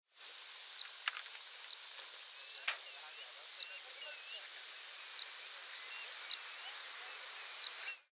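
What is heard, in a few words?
little stint voice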